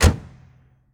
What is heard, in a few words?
Ferrari Testarosa Door Close; Automobiles